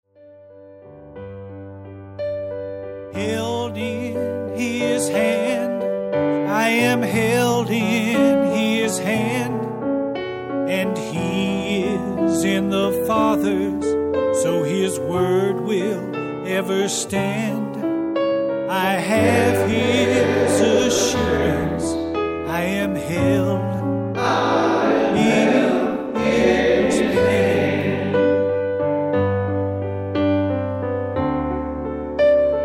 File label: Held In His Hand choir